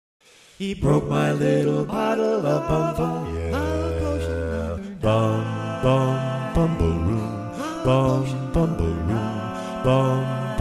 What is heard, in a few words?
Bass, Barbershop